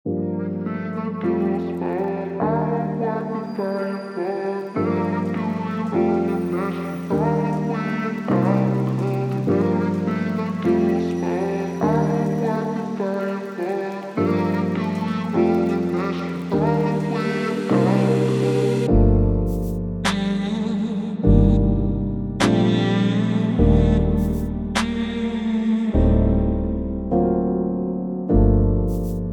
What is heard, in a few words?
102 bpm G minor Rain